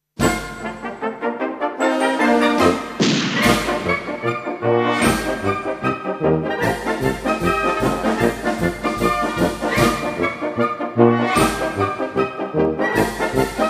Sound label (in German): Feuerwerkspolka